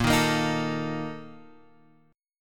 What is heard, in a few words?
A sharp Major 7th Suspended 4th